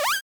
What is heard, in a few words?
Jump